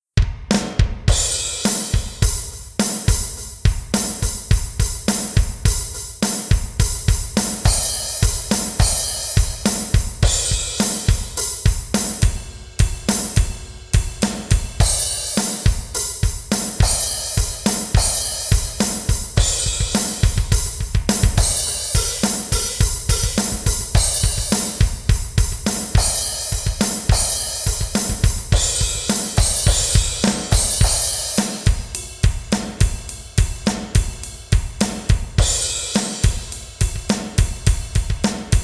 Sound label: drums